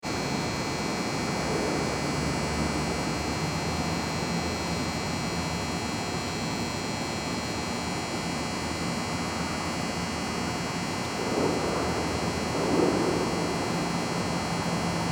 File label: Sodium Lamp; Sodium Lamp Cu , Garage Noises In Bg; Lights; Household